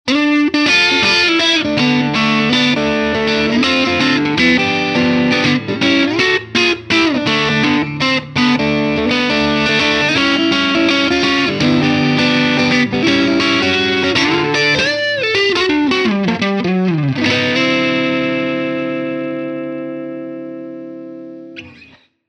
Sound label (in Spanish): guitarra electrica